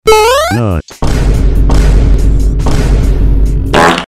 nuuut

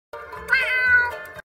meow

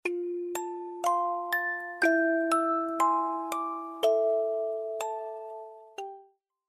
music box

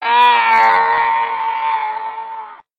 death slowed; lego yoda

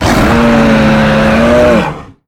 roar; arzuros

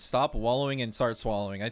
start swallowing

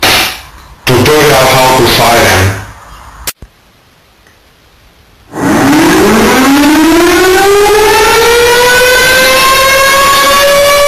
how to siren

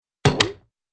thin bee woomp

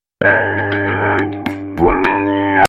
robot noise

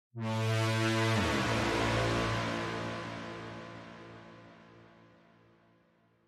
ultra soundtrack trailer horns

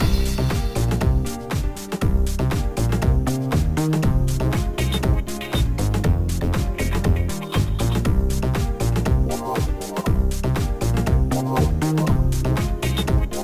main menu music; tetris party deluxe

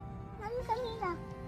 kamshamida cat

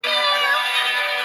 cute meow; yuumis

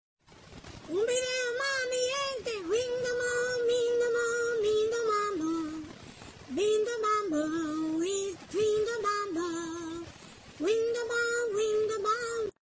jingle; kentucky chicken

